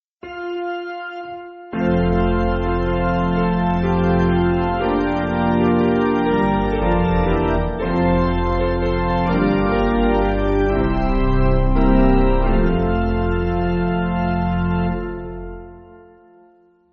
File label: OPiano; 1/F; Domine Deus; Crowther; Basic Piano & Organ (CM)